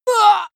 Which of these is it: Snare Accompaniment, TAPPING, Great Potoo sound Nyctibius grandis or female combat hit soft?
female combat hit soft